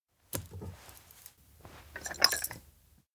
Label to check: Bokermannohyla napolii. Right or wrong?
Wrong